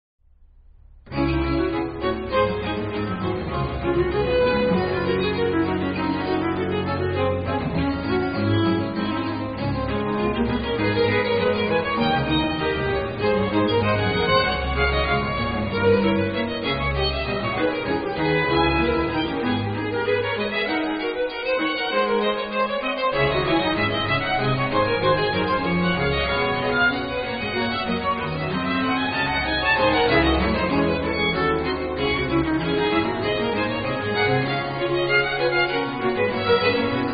Concerto for two violins in D minor Vivace

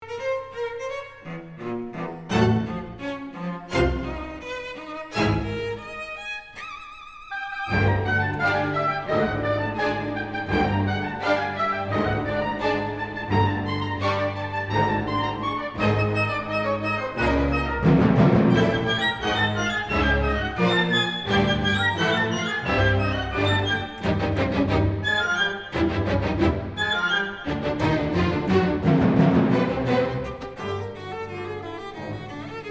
Concerto for Cello and Orchestra

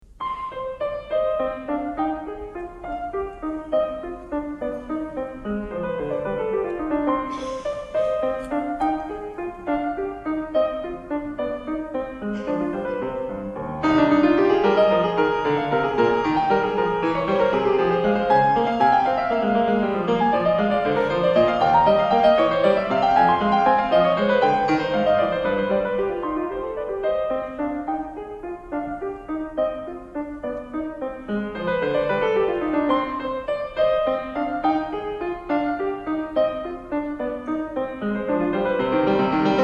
Partita No. 2 In C Minor, BWV 826

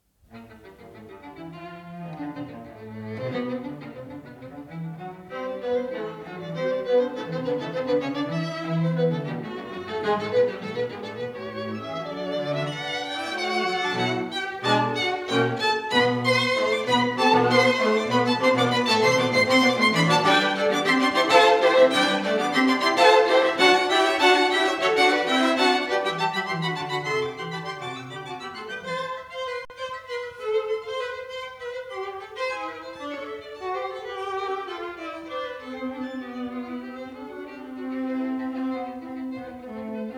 string quartet no 2

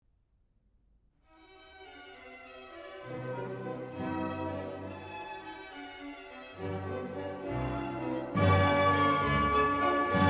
Menuetto allegretto

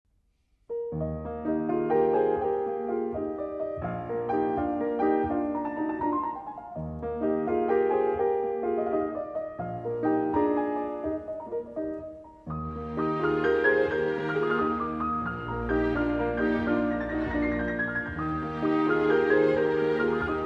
Concerto for Piano and Orchestra No 1 in E Major

